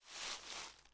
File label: leaf; gmod